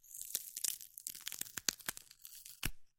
zvuki listev salata

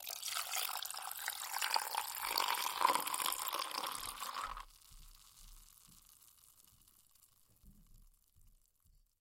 zvuki blendera i sokovyzhimalki

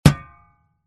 zvuki lopaty

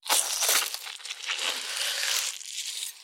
zvuki pererezaniia gorla

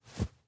zvuki vafelnogo polotentsa

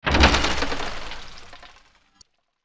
zvuki volshebnoi fei

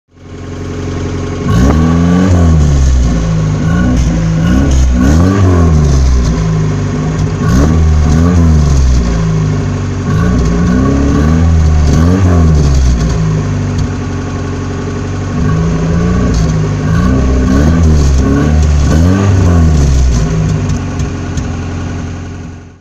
zvuki nivy

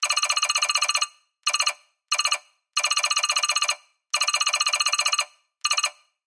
elektronnye zvuki peredachi dannykh

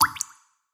zvuki rosy

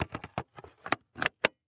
zvuki ruchnogo pylesosa

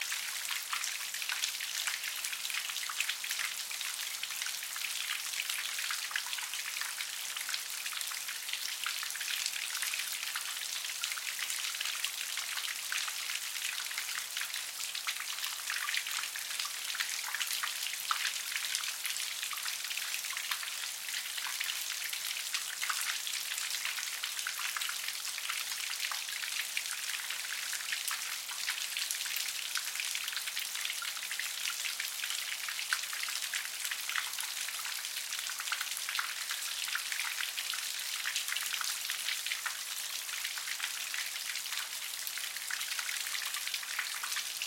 priiatnye zvuki dozhdia

